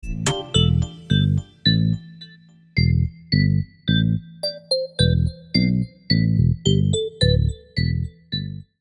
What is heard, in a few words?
vibe bell ringtone; android